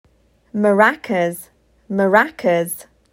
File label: Maracas